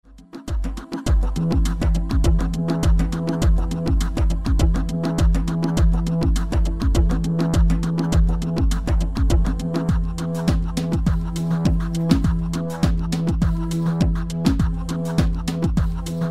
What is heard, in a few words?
beat sample